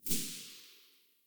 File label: aug silencer mono very far, weapons; STALKER; IWP